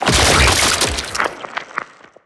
cage death start

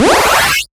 thumbs up amplified

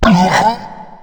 maggot primes; pestilence

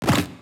poop hit; horizontalrunner

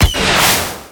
rocket launch tiny

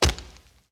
brain hit; horizontalrunner

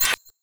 tooth and nail tick

